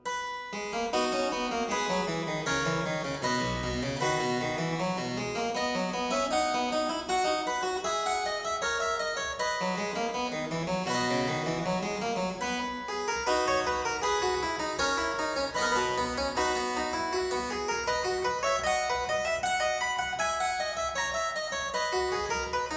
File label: Aria in C major - Variatio, harpsichord